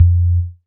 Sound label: Sub, drum